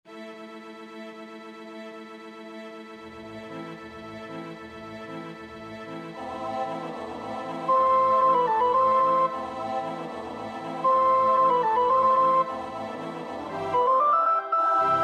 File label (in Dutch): alt 2; Orff (Carl); Carnina Burana